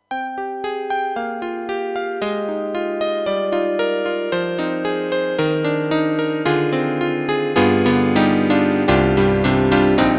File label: YAMAHA MU500 El.Grand, ピアノ